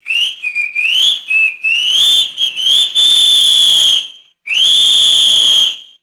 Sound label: multiple police whistle blows and trills